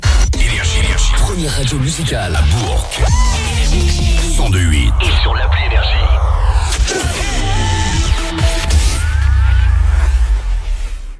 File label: JINGLE